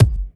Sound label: mid range kick drum single shot F sharp key